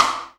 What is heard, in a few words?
DS4 crack; E-MU Formula 4000 Series Vol. 2; OrbitPercMaster